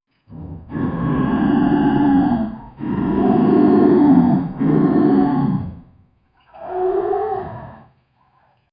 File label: a majestic lions roar